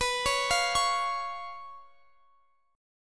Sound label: B Suspended 2nd Flat 5th; Bsus2b5; arpeggiated; x,x,21,18,18,21